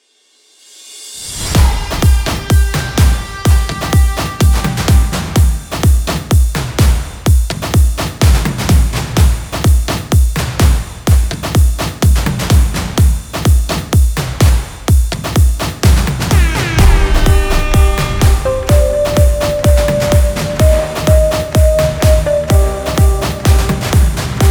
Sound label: intro drum; tancevalnaja muzyka